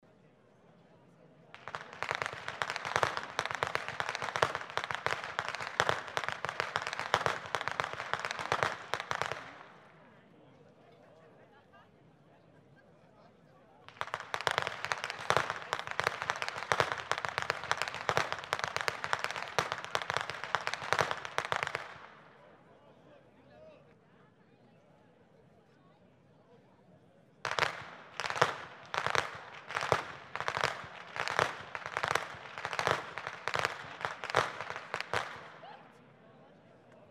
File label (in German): schnalzen, jugend; waging